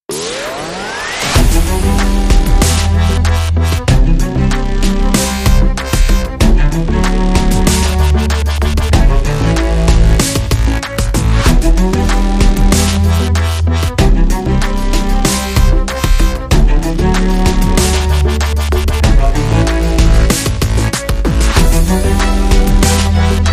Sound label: No Synth Lead